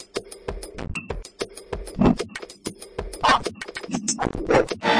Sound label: glitch offbreak, DRUM LOOPS and BREAKS, 192 bpm